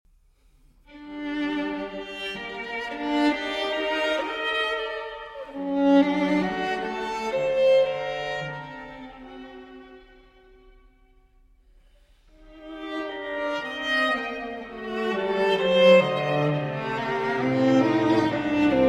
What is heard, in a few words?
Duo For Violin and Cello